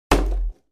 Simple wood hit impact

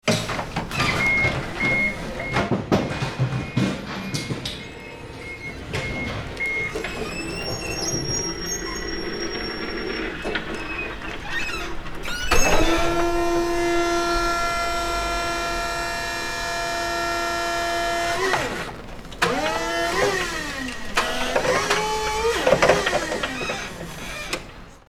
Forklift loading unloading beeping movement

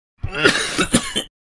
Middle aged man cough

